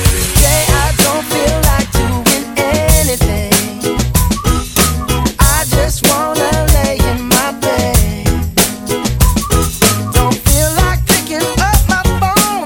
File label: 95bpm Abm